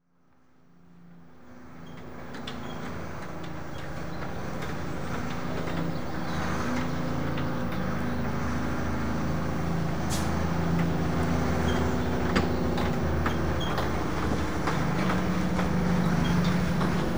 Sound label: Refuse Skip, Approach And Deliver; Industry; BBC Sound Effects Library